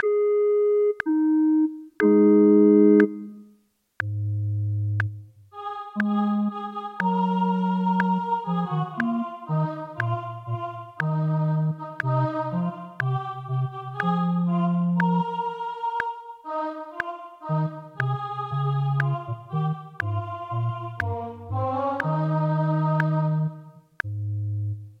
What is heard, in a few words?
O clap your hands; Gibbons